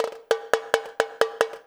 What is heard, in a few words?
FILL, Percussion